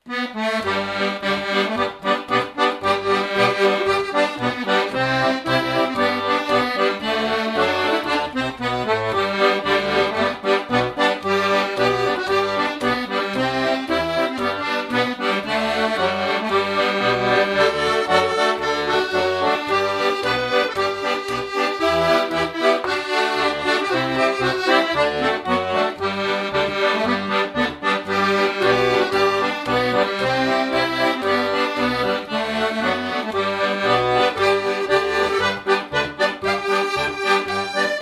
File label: Scotland The Brave, Accordion